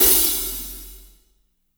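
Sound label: closed hi hat, BG HI-HATS; Spectrasonics; Burning Grooves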